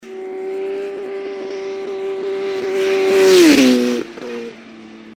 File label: moto